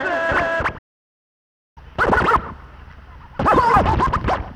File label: Wiggle Disc, Urban Grooves